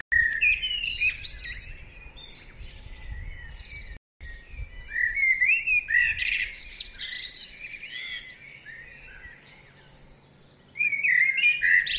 dun laoghaire blackbird

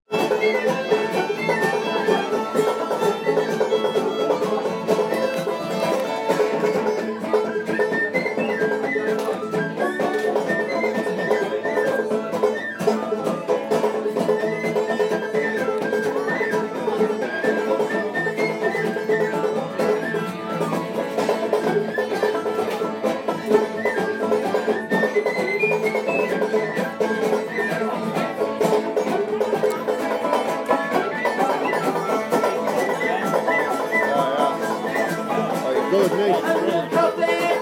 Irish pub music in Cork

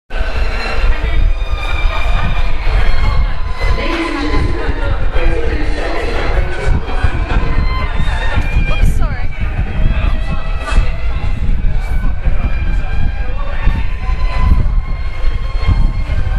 violinist and escalator in st paul's tube station

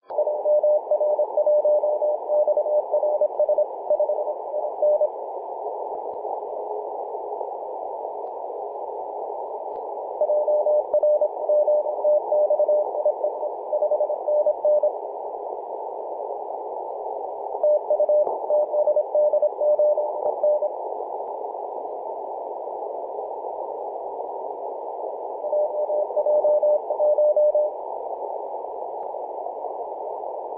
18.075mhz cw

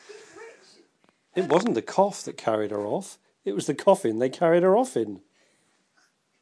cough

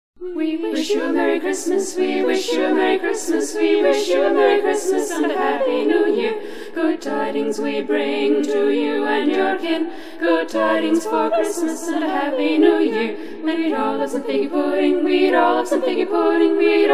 we wish you a marry christmas

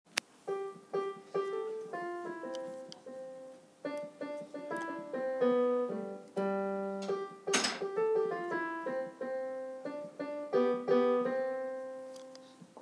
piano solo

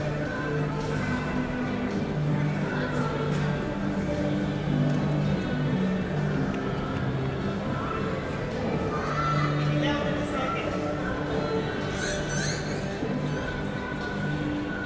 jingle bells

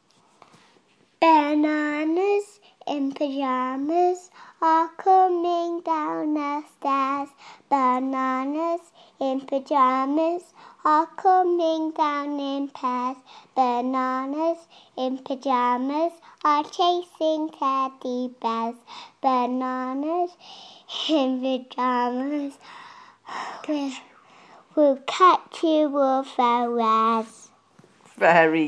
small girl sings

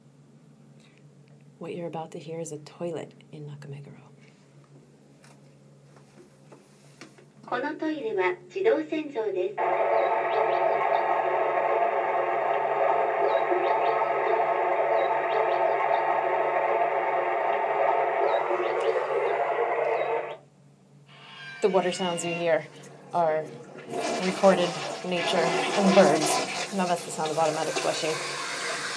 talking japanese toilet

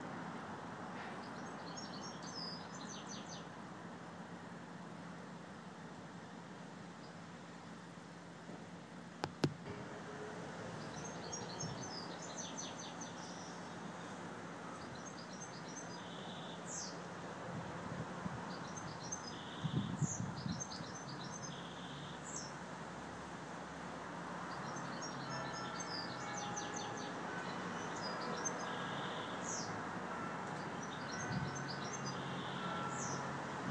birds and bells; bytheways

